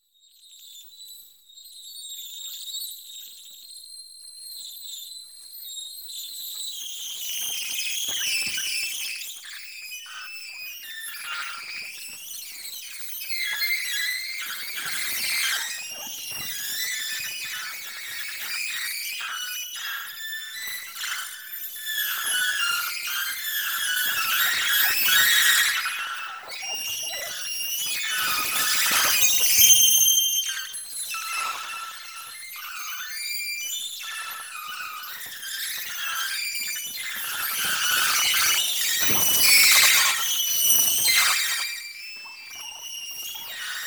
String Birds

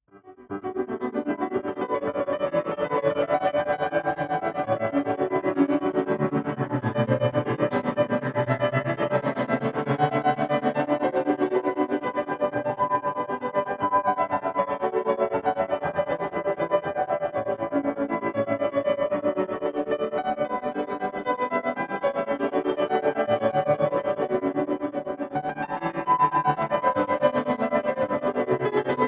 Vib Piano Bach Fast

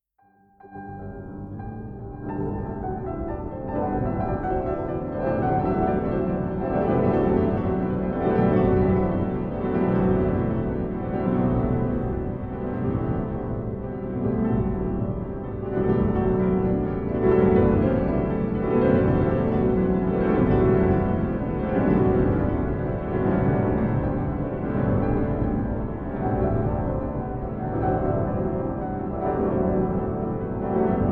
Vib Piano Bach Del Low Wave

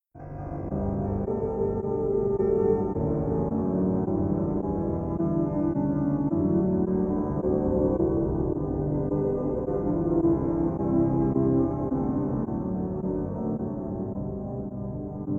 Vib Piano Bach Rev Bss Stacc

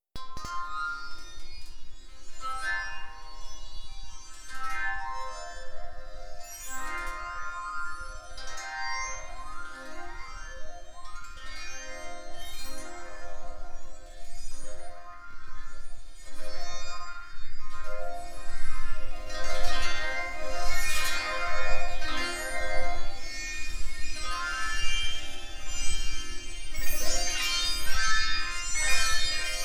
Moaning Metal Sitar; Unisoning, a samesex analogy

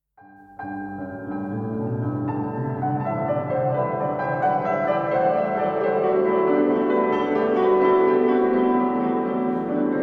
Vib Piano

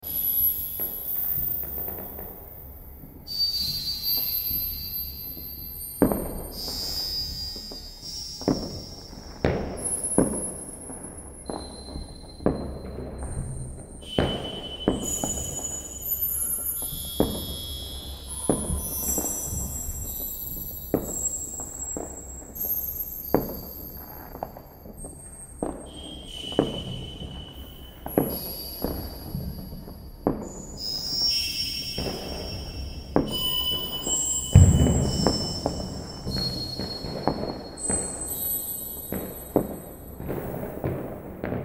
Fireworks memories

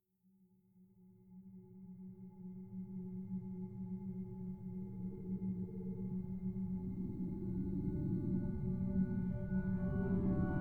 Ambient Orchestra

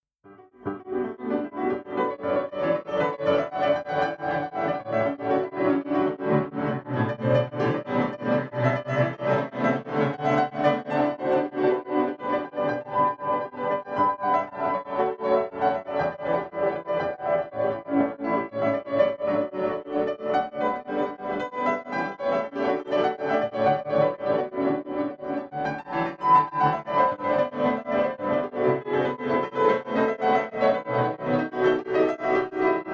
Vib Piano Bach

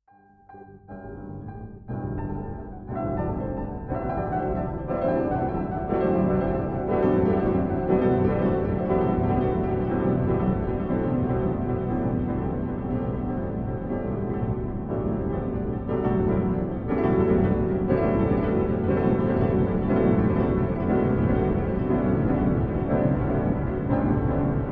Vib Piano Bach Del Low Slow

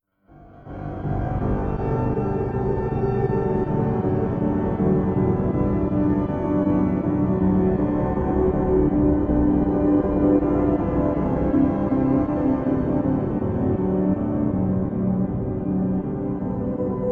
Vib Piano

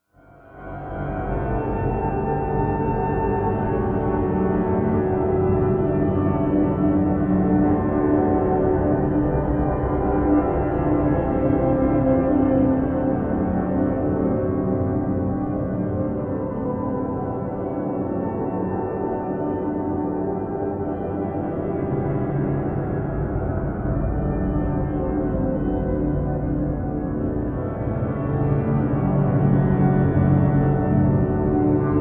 Vib Piano Bach Rev Bss

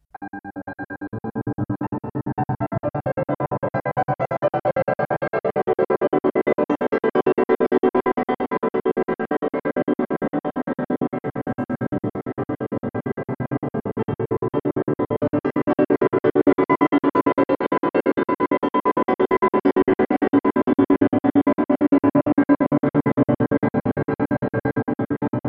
Vib Piano Bach Fast

